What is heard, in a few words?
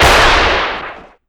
revolver engi shoot; tf2classic